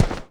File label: dirt walk